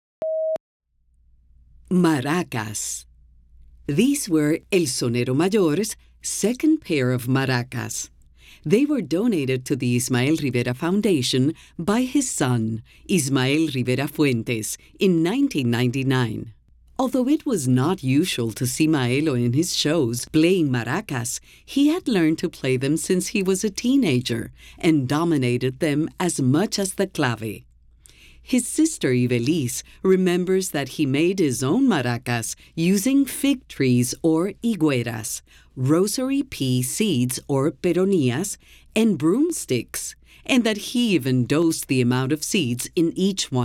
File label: Maracas